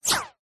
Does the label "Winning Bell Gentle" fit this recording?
No